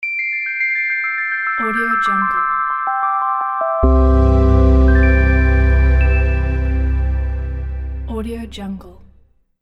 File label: opener magic bells ident